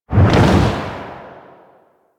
Reaper Sprint Whoosh; Warframe; Wraith

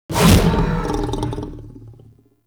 Monster Spit; Warframe; Infested

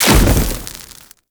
Casting Device On Damage Impact; Weapon Impacts; Player; Casting Devices; Ode Casting Device

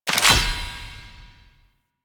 Lantern Item Pickup; Warframe; Emissary Season Two

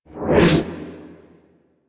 Cast; Condemnd; Warframe; Priest

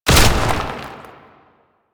Archgun Charge Full; Warframe; Archwing

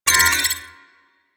Command Wheel Trans Open, UI; Railjack; Warframe

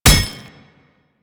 Ghoul Devourer Melee Impact; Warframe; Grineer Ghouls

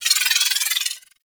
Pistol Proj Whiz, weapons; Warframe; Thanotech

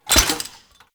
Sniper Alt Fire Mech React; DE; Warframe; Frumentarius; Exalted Sniper

